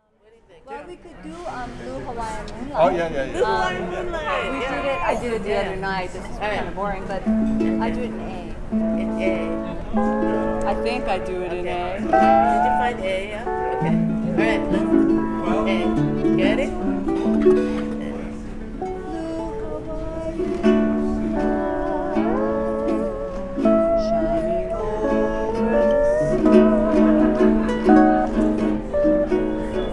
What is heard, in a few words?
blue hawaiian moonlight